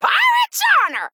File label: Female Parrot Attack